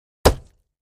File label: Axe Paneling 2 Side Du, axe hits